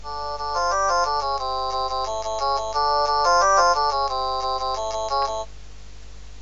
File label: Ring